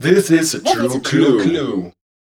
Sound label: VOICE FX, Dance Vocals